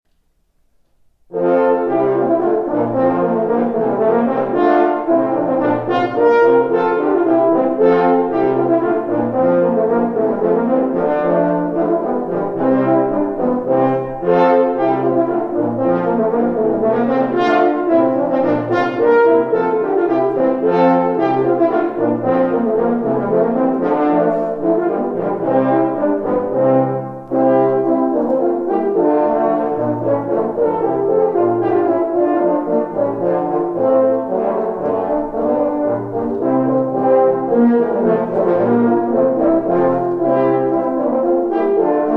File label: Hornpipe